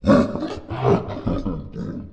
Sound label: laugh; finalmother